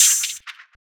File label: shaker, Perc; Percs